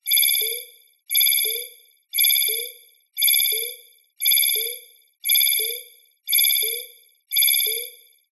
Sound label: science fiction computer console alarm beep warning countdown